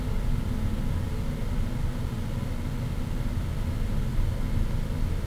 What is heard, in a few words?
ventilation; cstrike; mekko